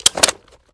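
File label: sniper reload fast mag out; gmod; iw8; xmike109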